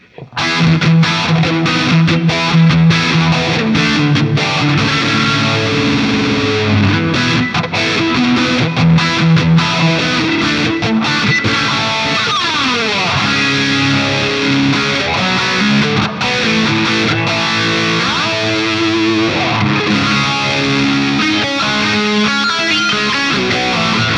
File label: Guild SFIII; 1966 Guild Starfire III; Axe-FX III through the QSC K12 speaker